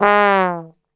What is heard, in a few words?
G#1 TRB, Brass Super Section; Best Service; 90 s Sample CDs